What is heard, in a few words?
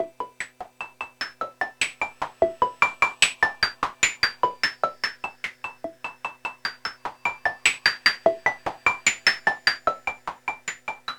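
sequence JP8; AMG; Now CD-ROM (Roland); Trance; G.T.M. SEQ 2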